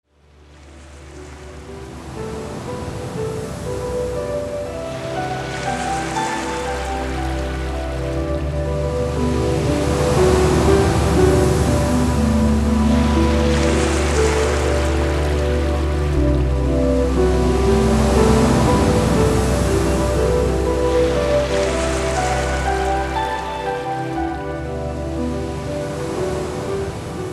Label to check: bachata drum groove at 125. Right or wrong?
Wrong